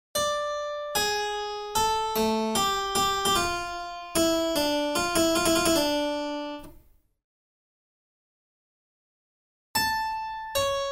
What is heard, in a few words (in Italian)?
Tema e risposta